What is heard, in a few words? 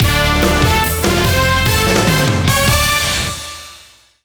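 fanfares field goal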